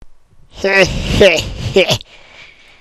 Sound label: hehehe